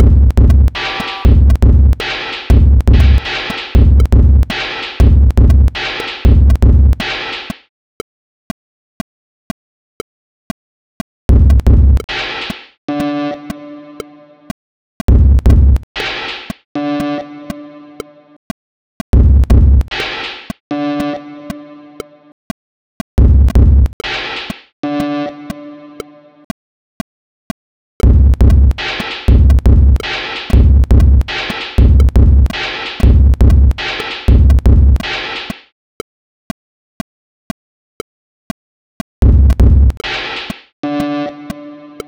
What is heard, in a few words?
sine wave